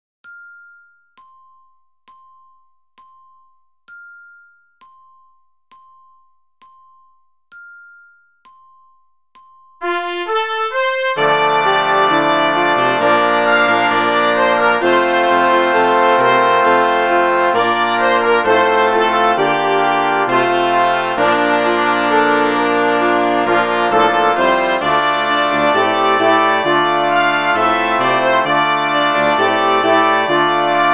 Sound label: click; team song jerusalem